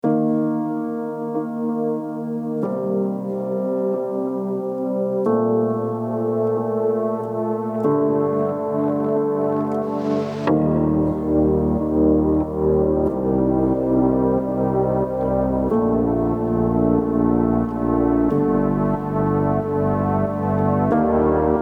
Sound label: Concrete Soul reduced